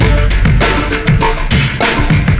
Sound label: Beat